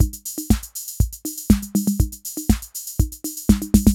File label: CR-8000 LOOP, DRUM LOOPS; Syntec; Wall of Sounds; TECHNO-BOX